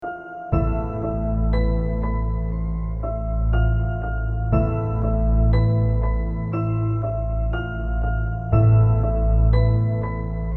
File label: Instrumental